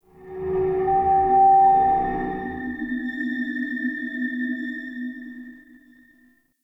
Psychedelic Moan Texture, Root Cave; Ambience; Neathuns Root Cave; One Offs

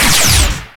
Trip Laser Impact; Warframe

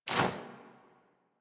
Generic Weapon Aim Transition Out; Warframe; Weapons

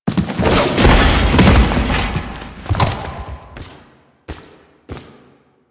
Mounted Phase Two Finisher; Enemies; Finishers; Warframe; Duviri; Dax

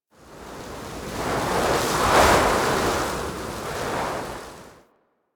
Forest Wind Fast Gusts; Warframe; Grineer Forest

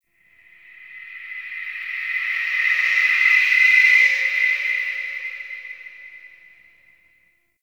Shriek, Ambience; Champion Crypt

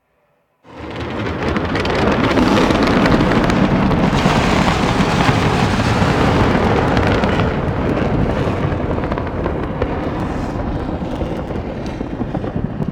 Infested Micro Planet Sun Monster Projectile; Warframe

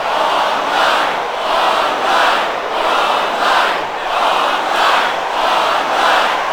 Stadium Crowd Encore Loop; Warframe; Quests; NineteenNinetyNine

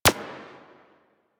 Decoy Counter Measure Shot

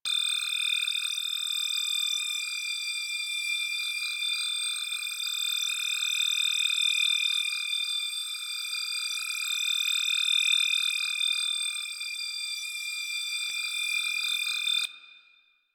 God Ray Beam Loop; Warframe; DE; Sentients; Sentient Trooper

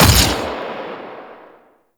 Fire Shot; Warframe; Weapons; Duviri; Incarnon Adapters; Incarnon Sybaris Prime

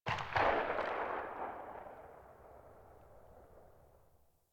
Hand Shotgun Tail; Warframe; Hand Shotgun Prime

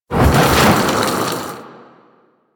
Spit Attack Projectile By; Warframe; The New War; Archon; Nira; Abilities

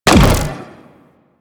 Swarm Missile Fire; Weapons; Warframe; Sentinel; Helstrum

